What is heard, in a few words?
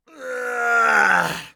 death; Escape from Tarkov